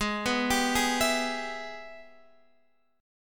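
Ab Minor Major 7th Sharp 5th chord, Guitar, Drop D tuning; arpeggiated; JGuitar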